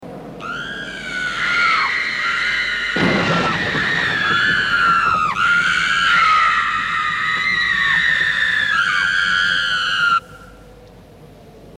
zwei schreiende frauen; Horrorgeraeusche

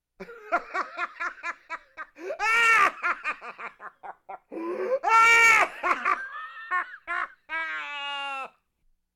gehässiges lachen eines hexenmeisters

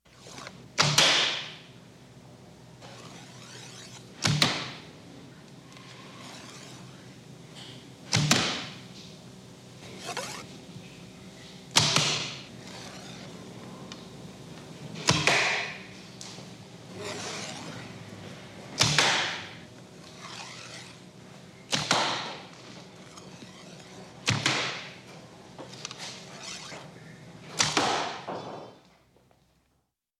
pfeil und bogen; Sport

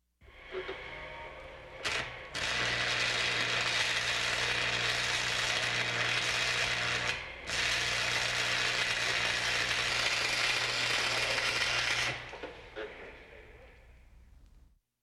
lichtbogenschweissen; Geraeusche

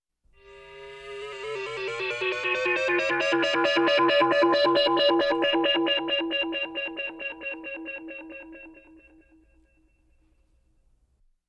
sirene; Science Fiction

